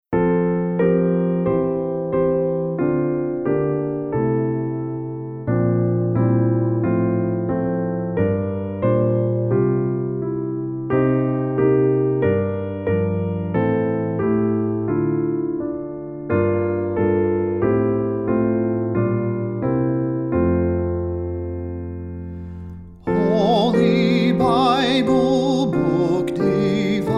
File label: hymn